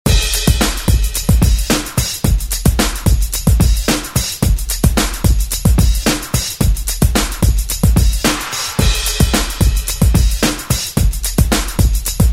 Christmas Redrum; Deejay Hit

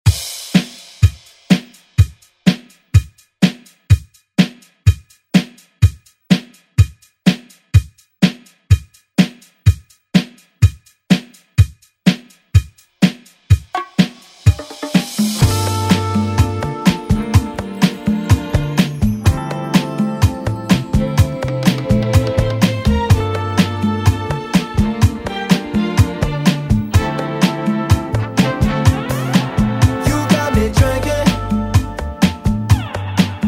remixplanet

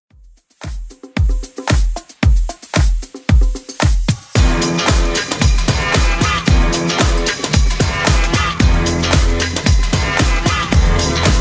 80s Club Edit 113 bpm; remixplanet

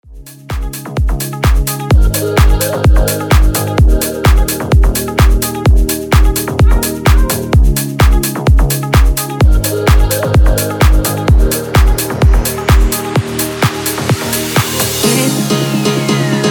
future house 128 bpm

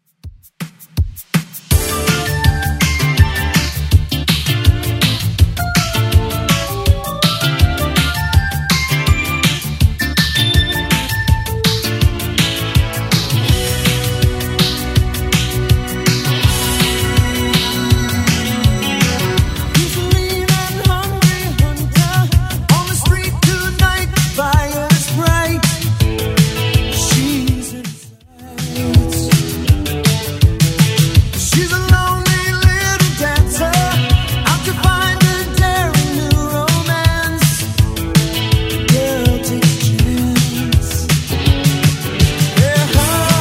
80s, 163 bpm; Redrum

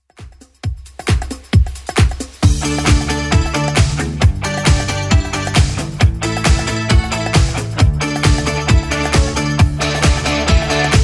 80s Funk 134 bpm CLEAN

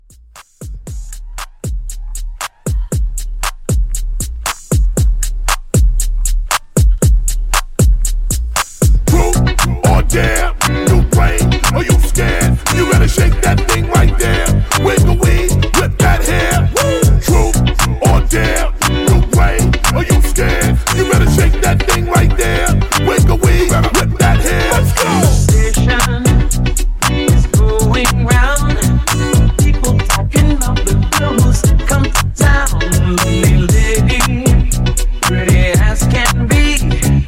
80s Funky Hype Redrum 117

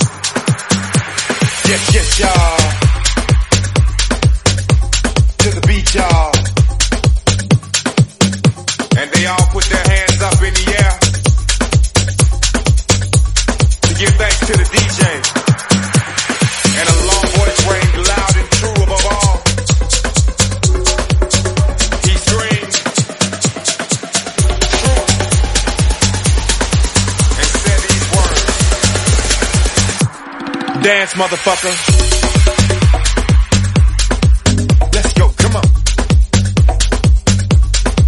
Dance 128bpm G

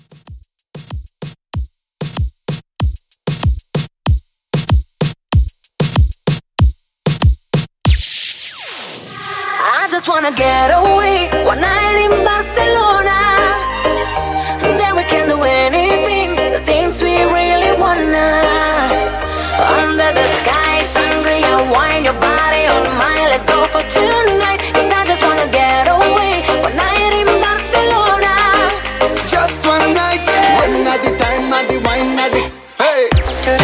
Pop Moombah 95 Bpm

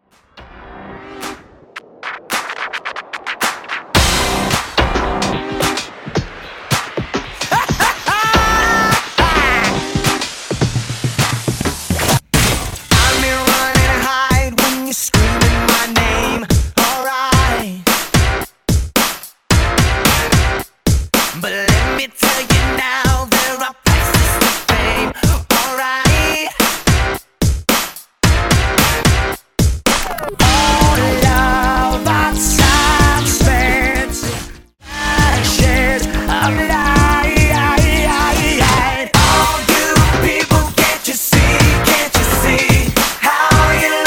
Re Drum 110bpm

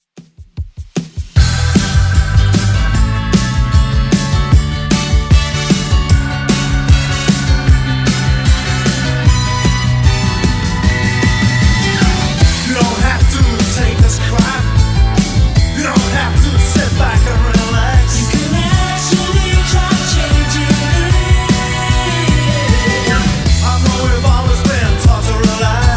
80s 152bpm; Redrum

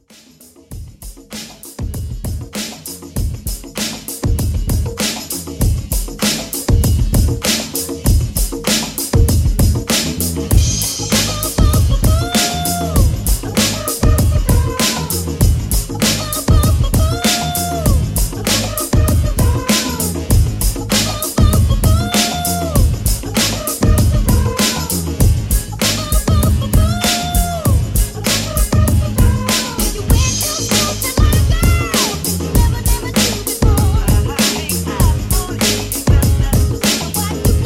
70s Soul Re Drum 98

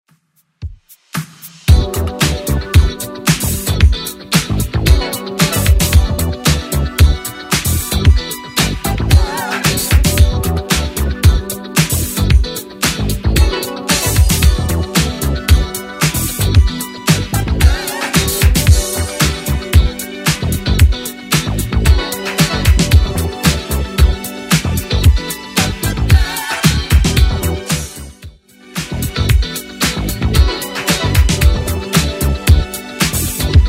80s 113 bpm; Redrum

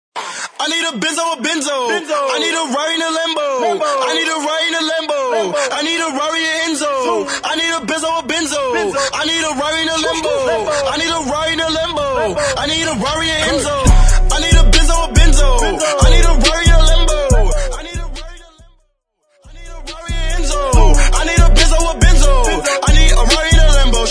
Acap intro

